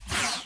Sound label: shot sniper rifle